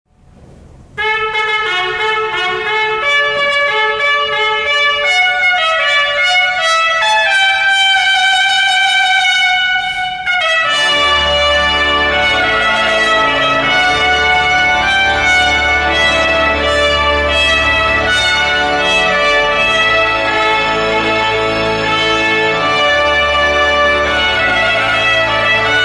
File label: Fanfare and Trumpet Voluntary